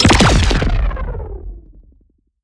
Parry Large Blaster Distorted Cruncher

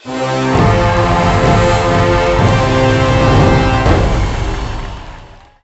Race Win; pony friends 2

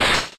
Horn Attack

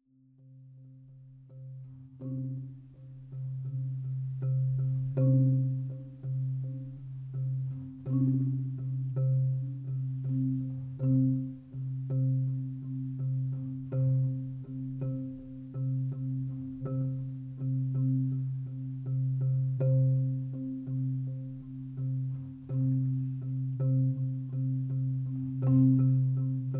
Marimba and Drums Mood

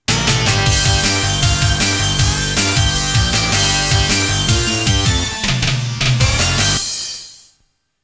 Stage Clear; batsugun; toaplan

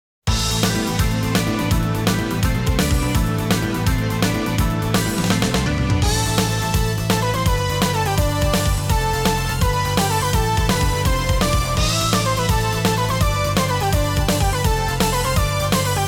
Underground Cave; Perfect Collection Dragon Slayer The Legend of Heroes II

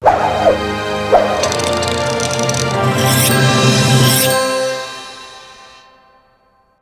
Hook Pickup Mini Fanfare; Windlands; PS4

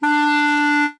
Clarinet tone; game rip; lego my style preschool

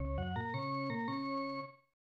Organ Music; NHL Stanley Cup; SNES

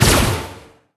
Unstable power; Dark Army Blazing Mage

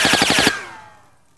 Impact Pow

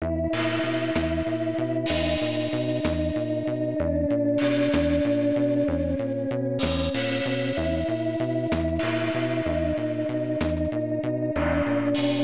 Dungeon

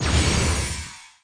Light shell

